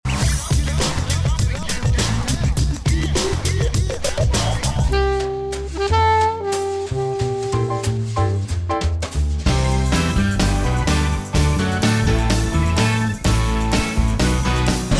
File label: music samples